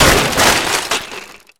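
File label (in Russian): грохот упавших принадлежностей в гараже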